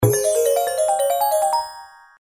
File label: jellymania expand wild